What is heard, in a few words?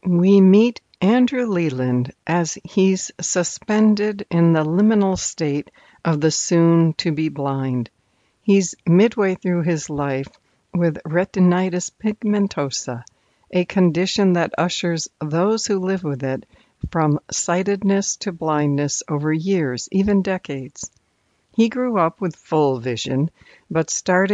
country